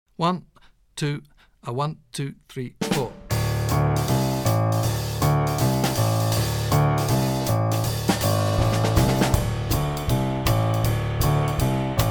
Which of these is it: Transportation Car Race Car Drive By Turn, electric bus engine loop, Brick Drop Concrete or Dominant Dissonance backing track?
Dominant Dissonance backing track